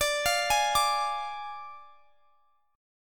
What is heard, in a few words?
D Diminished D; Ddim arpeggiated; x,x,24,22,21,22